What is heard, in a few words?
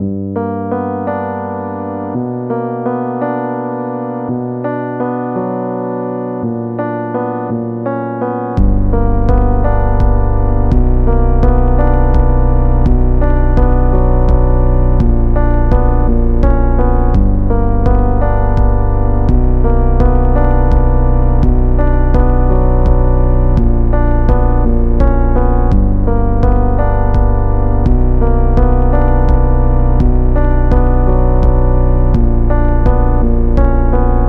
Piano Tracks Improve in G